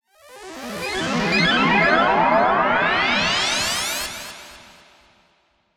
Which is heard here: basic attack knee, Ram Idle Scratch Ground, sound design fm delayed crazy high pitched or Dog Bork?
sound design fm delayed crazy high pitched